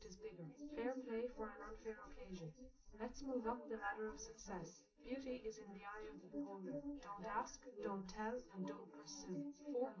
female live rec 250ms 1m; SiSEC11; underdetermined

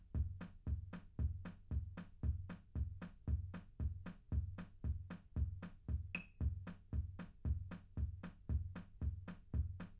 wdrums liverec 250ms 5cm; SiSEC11; underdetermined

